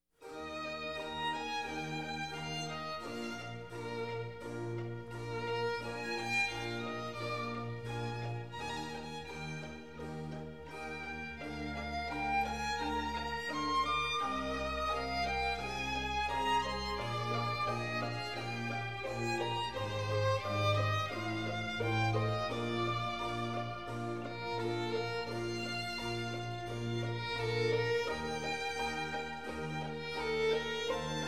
Violin Concerto In F Minor; Vivaldi; The Four Seasons

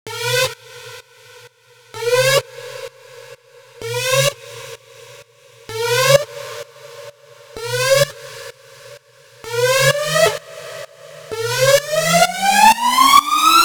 note fx, Key A, Bpm 128; Loop Nerds; Big Room House Allstarz

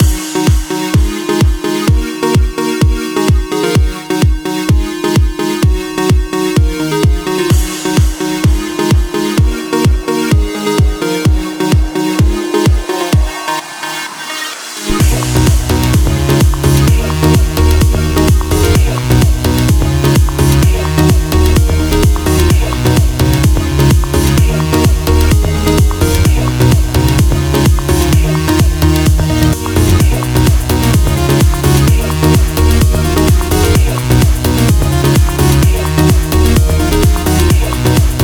FULL MIX, Big Room House, Key A, Bpm 128; Loop Nerds; Big Room House Allstarz

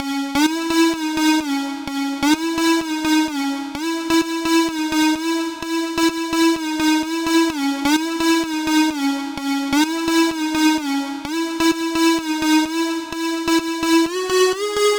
synth melody 2, Key D#, Bpm 128, Big Room House; Loop Nerds; Big Room House Allstarz

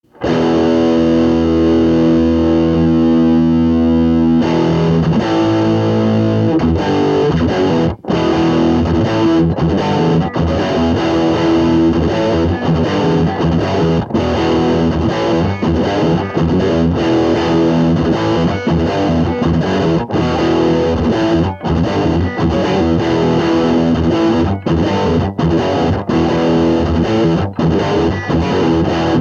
riff tapes

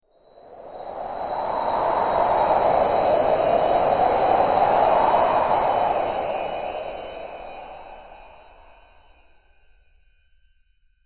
Christmas wind chimes transition

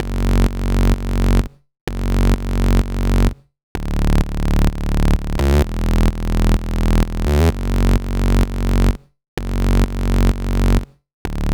bass, Key F#, Bpm 128, Big Room House; Loop Nerds; Big Room House Allstarz

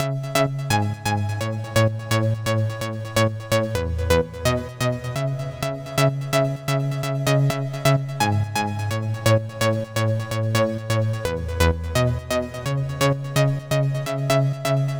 synth chords, Key A Bpm 128; Big Room House; Loop Nerds; Big Room House Allstarz

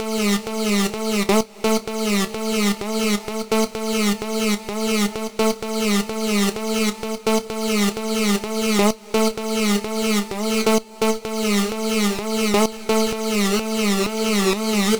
note repeat, Big Room House, Key A, Bpm 128; Loop Nerds; Big Room House Allstarz

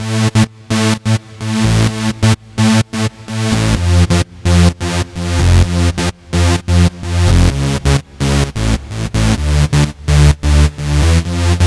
low synth, Key A, Bpm 128, Big Room House; Loop Nerds; Big Room House Allstarz

